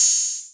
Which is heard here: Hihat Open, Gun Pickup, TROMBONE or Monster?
Hihat Open